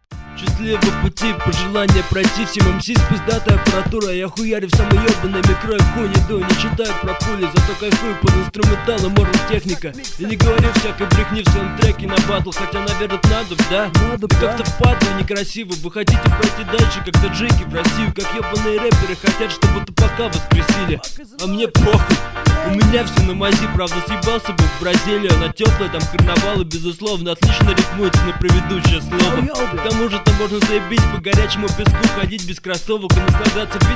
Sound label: meow meow